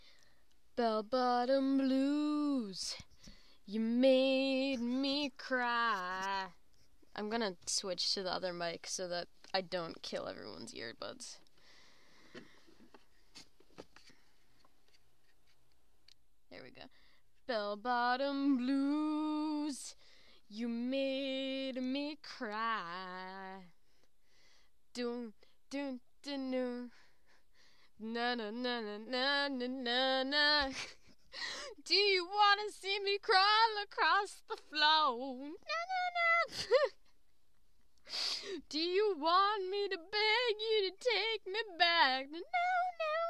aoke

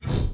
Monster; Zenless Zone Zero

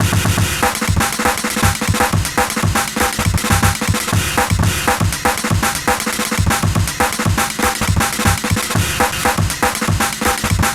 Drums, Fan-made Music; Wrap Up High Shit Vortex; Pony Music Archive 20.09; Friendship is Traumatizing - Season 1

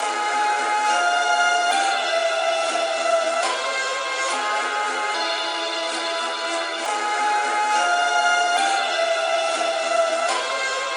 StringPad High; 140BPM; Pony Fan Music Remix Stems

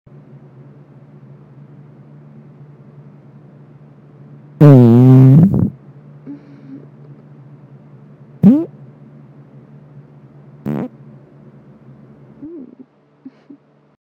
Morning fart

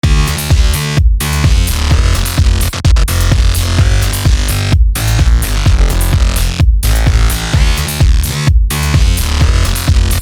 Electro hoooooose

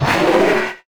Monster; Zenless Zone Zero

